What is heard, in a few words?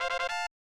Item Chime; Flintstones The Treasure of Sierra Madrock; SNES